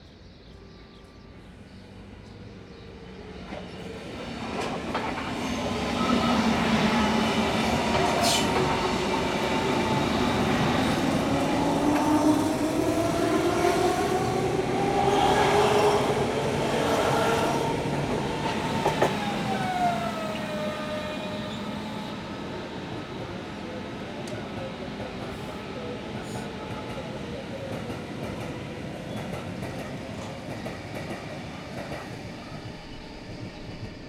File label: Local Train Close, City Traffic